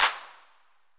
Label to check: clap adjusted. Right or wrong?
Right